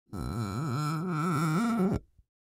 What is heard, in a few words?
Dragging finger on glass